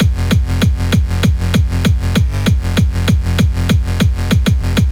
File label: KICK BASS